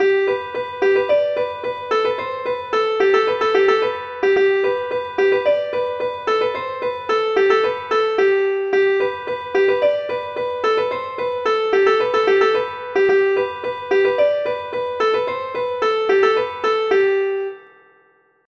suite polkas

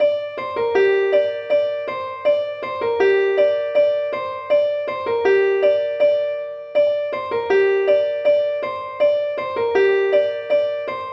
suite hanter ancien

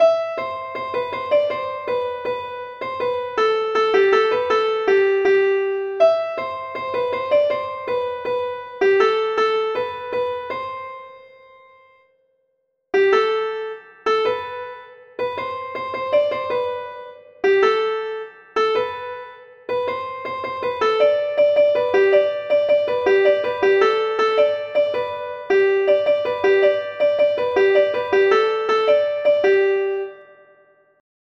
galop

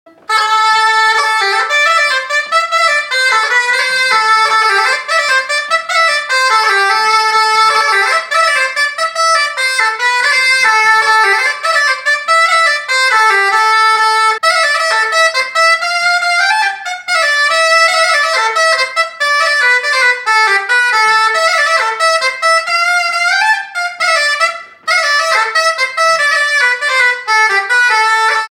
avant deux travers

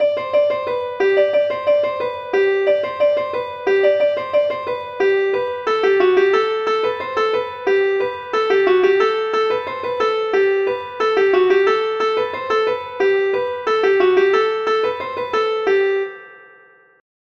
suite ronds

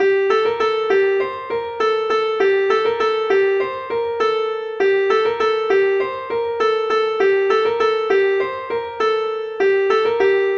suite passes pieds

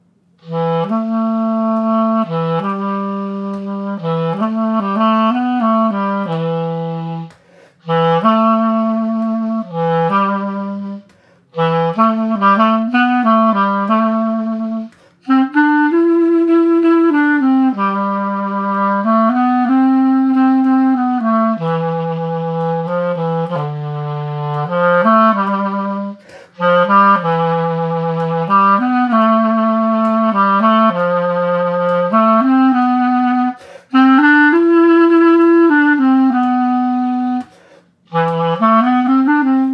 valse du matelot partie clar

